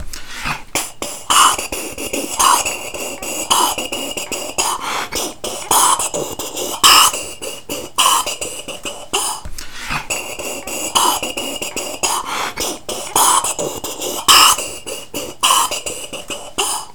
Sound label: Beatbox